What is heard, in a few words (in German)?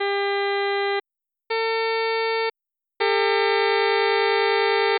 Grosser Ganzton 9zu8